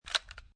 MP5 9mm Load, Gun Handling; VRSonic; Guns

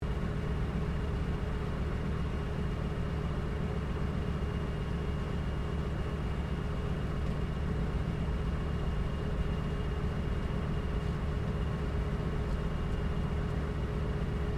AIRCRAFT CA-13 BOOMERANG IDLE INTERIOR STEREO; Airplanes

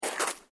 SNOW SCRAPE

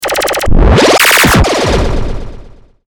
Stinger Glitch Zap; Production Element Imaging Element Accent Transition

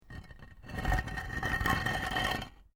PAVING STONE MOVE, dragging; Material components for constructing more complex sounds; Objects

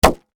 Bag Pop Bang, Foley, Plastic; Sound Effects:Materials

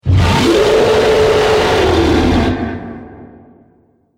Scream Huge Monster, Monster Sound Effects

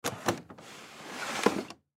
Desk Drawer Slide Open Victorian Cylinder; Desk 1875; Foley; Blastwave

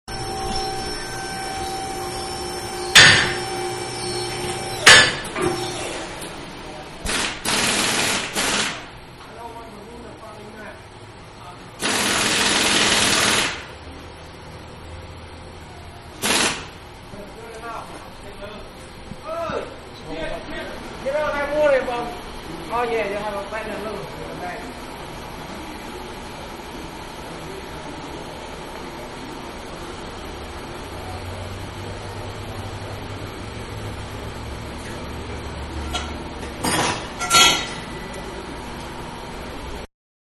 Garage-Ambience; Auto Shops (Sound Effects:Backgrounds); Manhattan Production Music

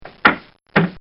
Cartoon Sound Effects Fall; Cartoon Sound Design; Bobby Cole

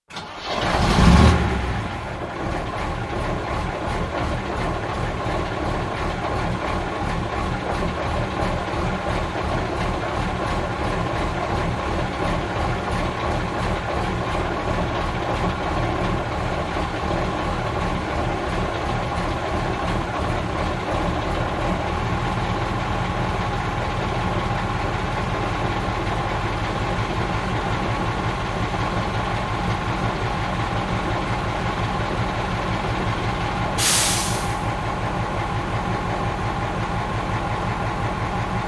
Fire engine started, idle, switch off; Emergency Vehicles; BBC

